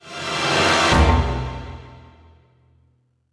Music Boss Stinger Stereo; Plants vs. Zombies Battle for Neighborville; MushroomBoss

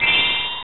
Credit SFX; Aero Fighters; OKIM6295; arcade

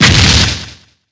Mag Fire1

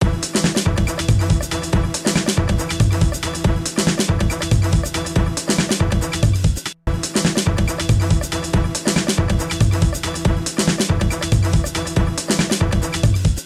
bgm summer battle

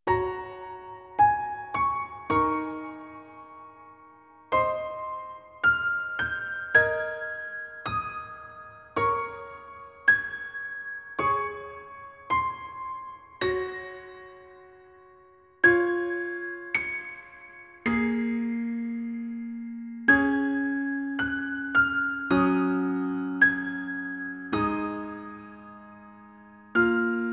Distant Years piano; Clannad; video game original soundtrack

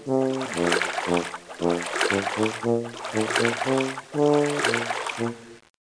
Clock Bath reward; Fisher Price Ready for School Kindergarten

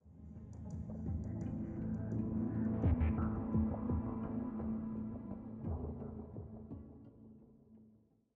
85bpm; Legends of Pegasus; windows gamerip 2012

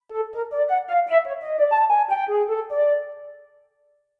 flute

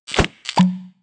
Sprinkleshell Active Idle; Candy Crush Soda

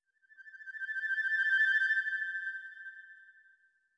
flute trill; Sky Children of the Light gamerip

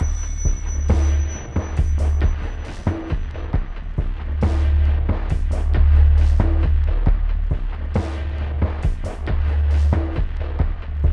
Ambience; Kate; mirror's edge gamerip

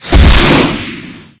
Vehicles; SFX Vehicles; Scorpion

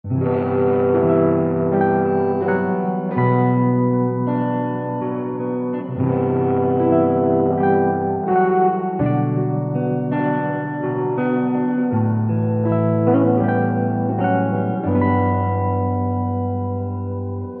Guitar and Bass Harmonica Ending

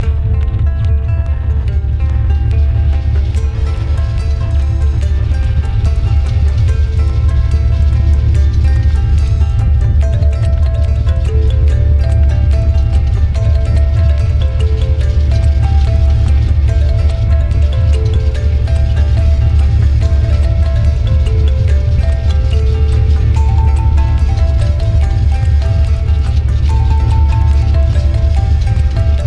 rocket ambience; tintin destination adventure; ps1

